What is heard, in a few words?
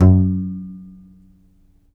C. Bass Balalaika, Plucked Strings